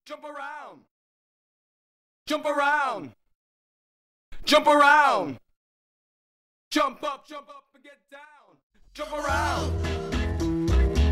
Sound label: ACA IN HOUSE 108 BPM